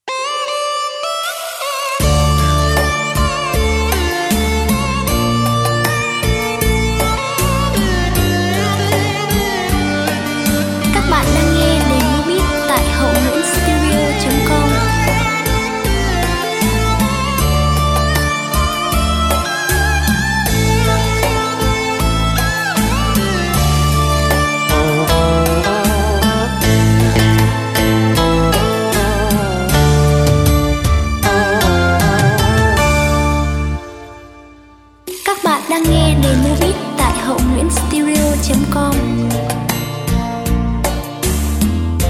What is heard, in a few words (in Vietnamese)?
beat